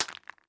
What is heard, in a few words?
harvest quartz